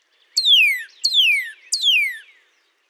Northern Cardinal Song one simple series

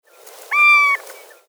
Willet Western Keer Keer wee wee

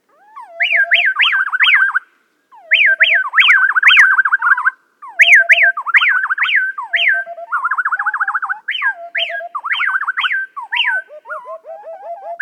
over amplified

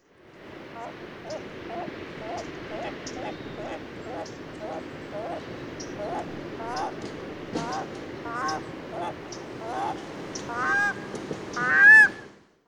grunts, Stinson Beach CA

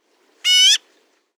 Black-billed Magpie

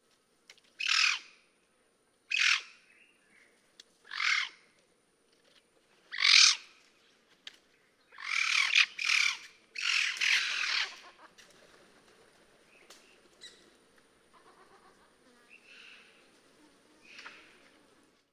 Laughing Falcon

